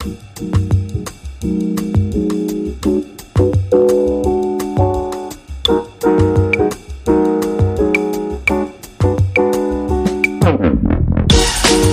Drum and Bossa, Underscore

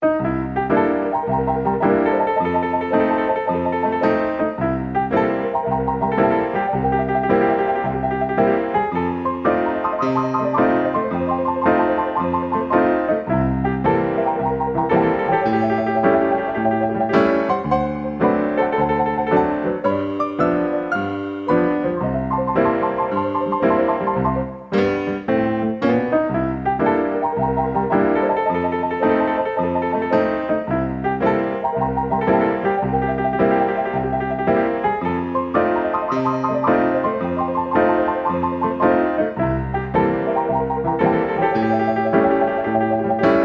Piano Honky Tonk Theme

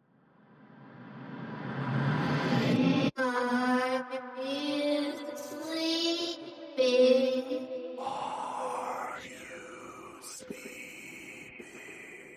Horror, Vocals Only

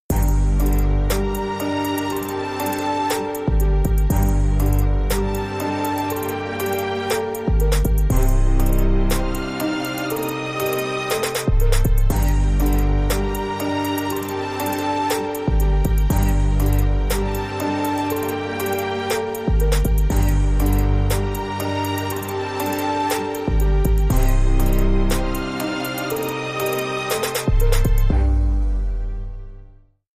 Trap Step Symphony

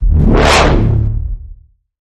Bend The Bassline

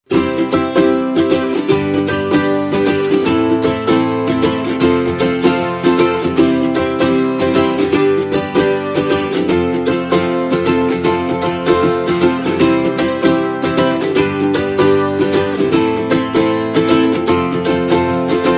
Ukulele and Guitar Mix

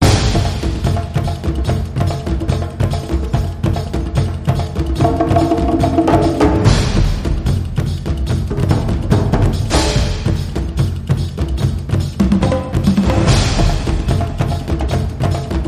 Epic Drums

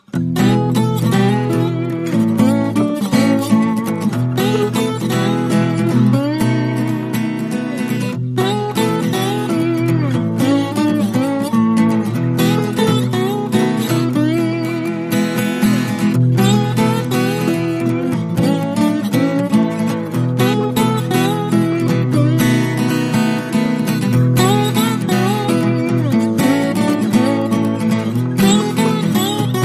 Happy Tom, No Drums And Bass

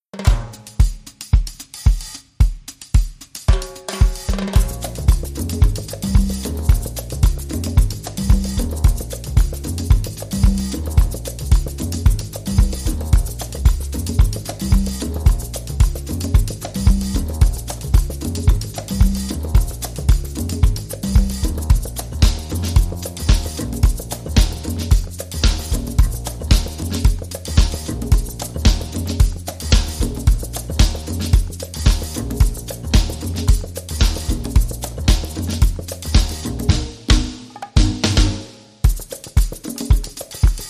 The Salsa Drum and Bass

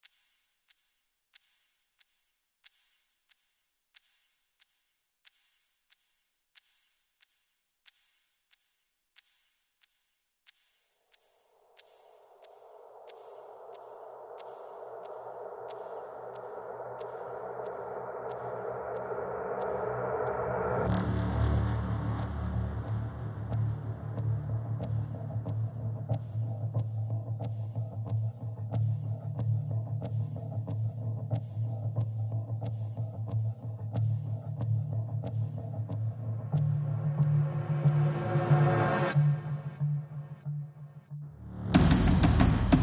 Drums SFX and Synths Only

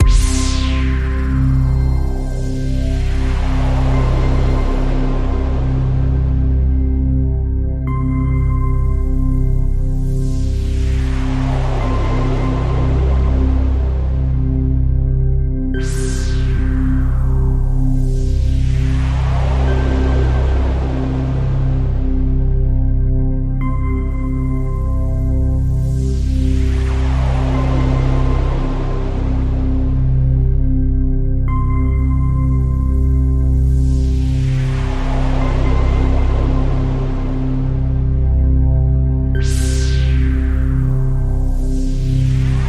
Radio Toolkit Pad

